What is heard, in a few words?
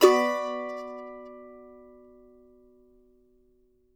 mando min; Sampleheads; Whole Lotta Country 1 & 2